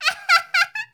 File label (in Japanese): syozyo warai